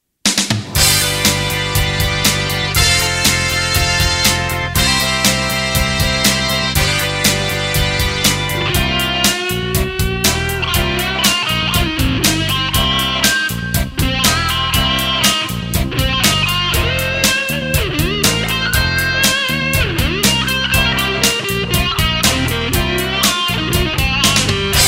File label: c blues lead, guitar